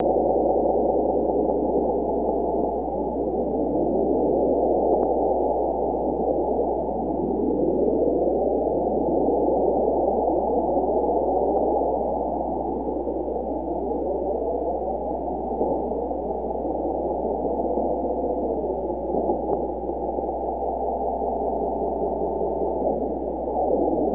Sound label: 160 Radar tuning around